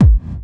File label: Techno Kick Reverse